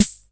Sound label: sd, Hammond Auto Vari 64 1974, DRUM MACHINES